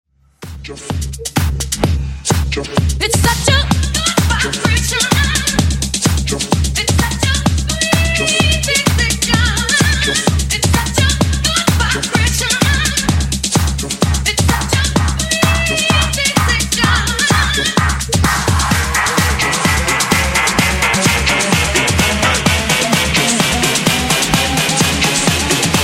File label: mash up 128 bpm